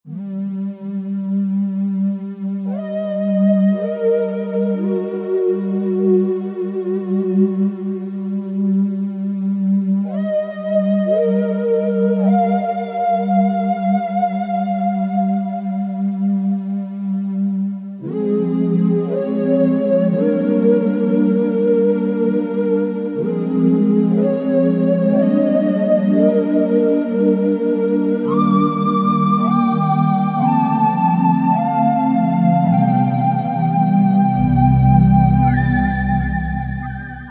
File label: JP-8 Haunting; Roland JV-2080